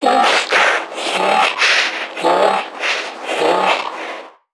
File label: Creatures Vocalisations Infected; NPC; Five Nights At Akers; soma; npc construct